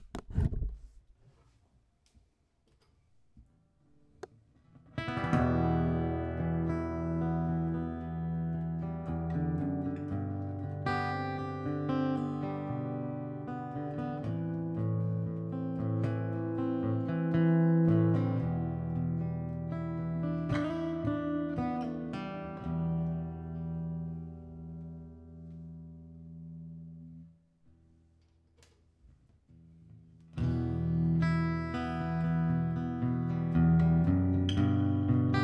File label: Samick GD 200SCEN